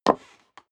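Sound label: step high heels wood creak single stair up, footsteps; schritte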